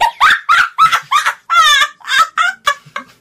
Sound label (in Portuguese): risada